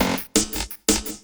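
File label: LOOP snare drum, Drum & Bass, 170 BPM; Ueberschall; Drum & Bass Resonance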